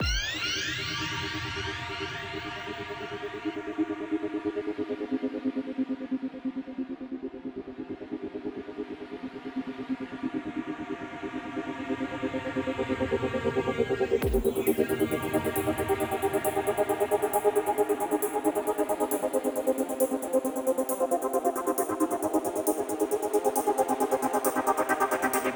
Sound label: dubstep